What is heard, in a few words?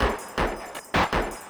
LOOP L, Drum & Bass 160 BPM